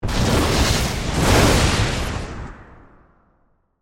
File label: Magic Wand Cast, Horror, Magic, Wand, Cast, Spell, Heavy